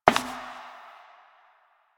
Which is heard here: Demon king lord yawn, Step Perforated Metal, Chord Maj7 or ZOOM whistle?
Step Perforated Metal